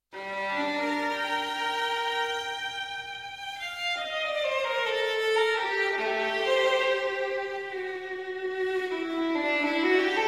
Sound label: Sonata No. 1 in G Minor for Solo Violin; BWV 1001